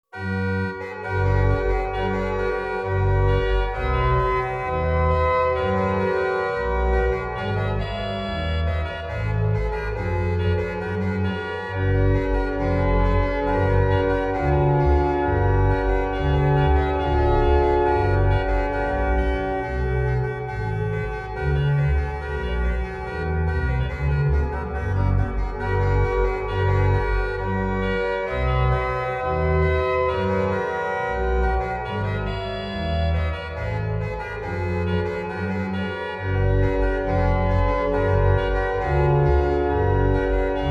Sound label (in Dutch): Nun danket alle Gott Koraal; J.S. Bach